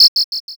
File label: whistle short